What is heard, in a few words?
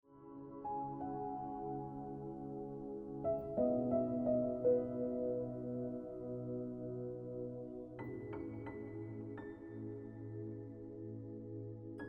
Looking Back Ringtone Piano Music